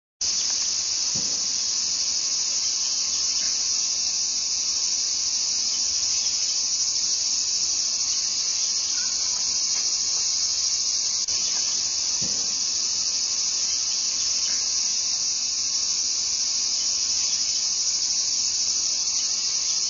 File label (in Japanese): aburazemi; ikimono; doubutu